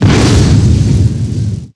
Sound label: Aux Explosion